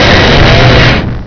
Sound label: chainsaw hit, weapons